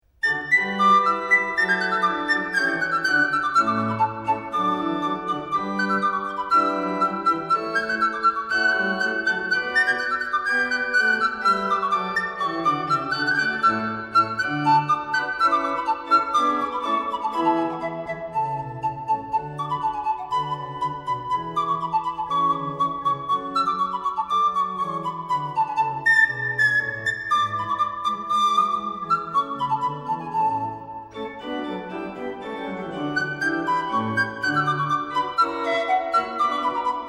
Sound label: pipes, pan